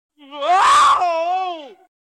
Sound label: goofy screams